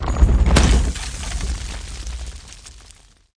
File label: free spins symbol drop; aloha